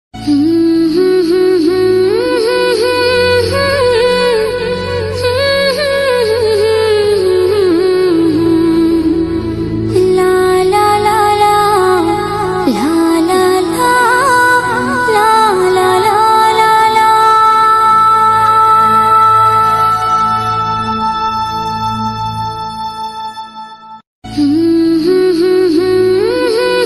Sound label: hu hu hu la la la nepali ringtone, nepali ringing tone